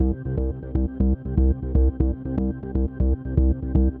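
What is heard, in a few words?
Ambienza Bass Line 120 C minor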